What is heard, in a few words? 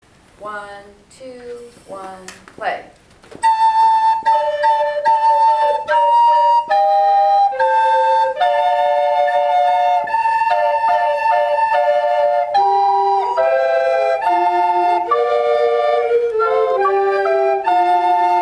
FOUR SPANISH VILLANCICOS, recorder; class recordings